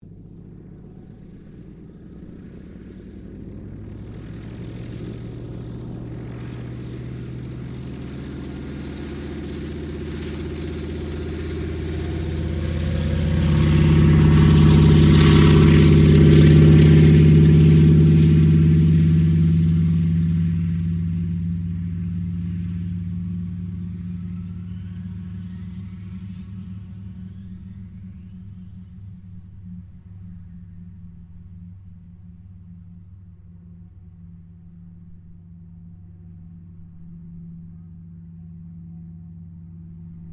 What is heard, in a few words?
AIRCRAFT CA-13 BOOMERANG TAKEOFF; Airplanes (Sound Effects:Vehicles)